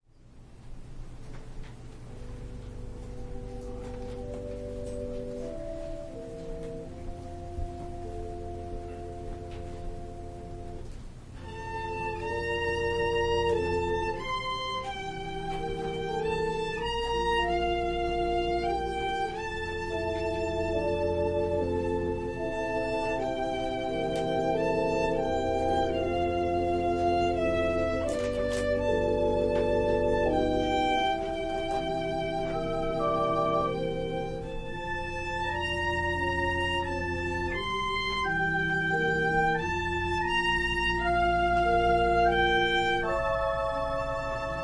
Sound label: Organ and violin prelude